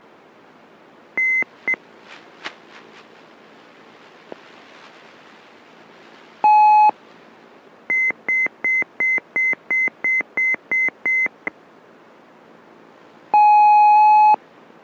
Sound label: vario